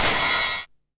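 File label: 909 ride tape saturation; Goldbaby Tape808 & Tape909; The Tape 909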